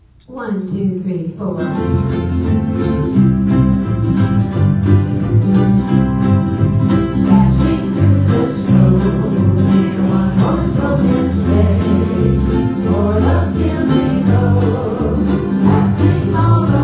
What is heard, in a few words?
Jingle Bells